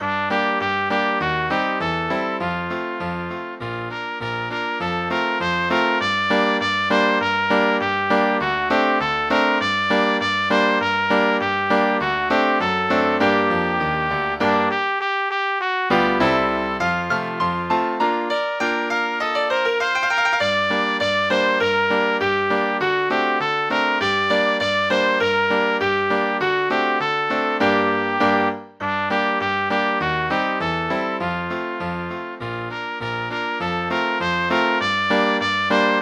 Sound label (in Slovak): pre trúbku a klavír; Muzikanti co děláte